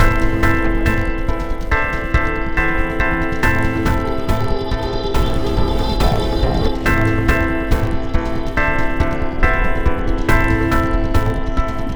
Trainride Stationary Medium; rive wreck hack die retry; ps4

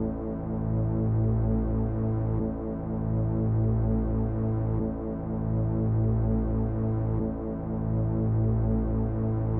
BASS DRONE; Vostok Inc.

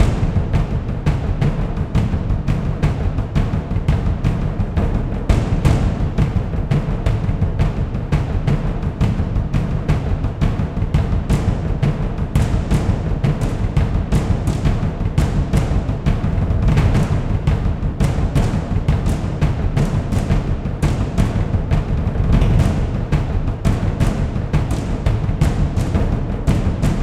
Epic Drums Looped; Dual Blade Battle of the Female Ninja

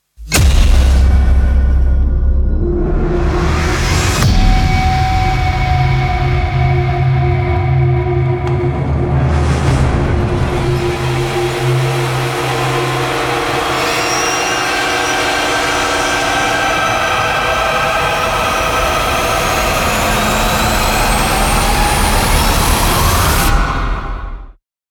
transition; Doom; Hell Guard

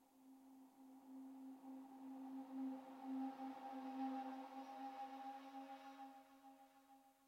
Jingles STING; Semblance

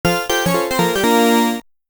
Break Music; Sky Kid

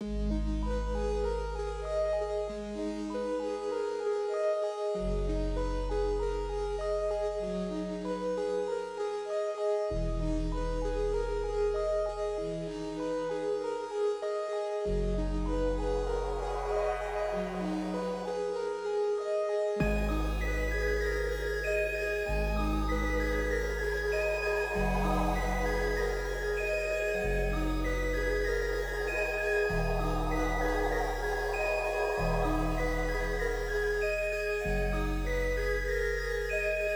Cutscene music; Arca's Path VR

